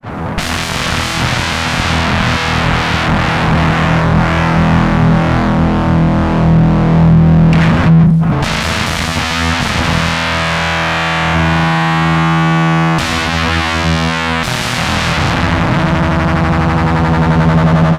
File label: fender blender